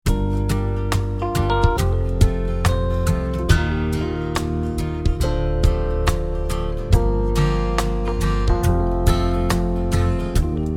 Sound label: sunday shuffle key of Bb; improvisewithjelske